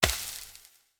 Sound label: projectile acid burn; factorio; Factorio 0.14.21; creatures